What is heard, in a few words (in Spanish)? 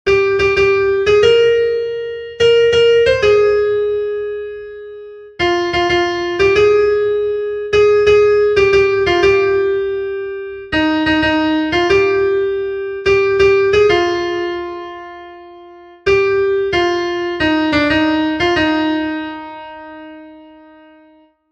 SOPRANO, himnos en piano; cantos del camino